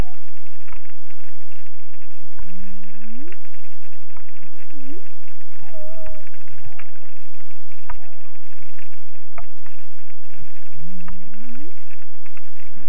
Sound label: humpback whale